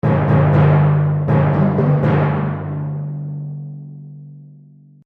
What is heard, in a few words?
War Drums Stinger; Stinger Sound Effects